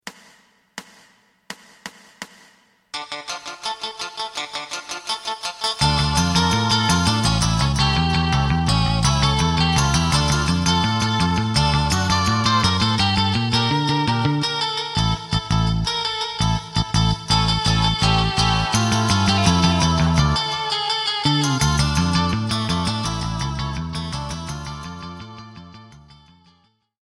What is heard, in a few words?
drum